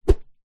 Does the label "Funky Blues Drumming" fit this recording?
No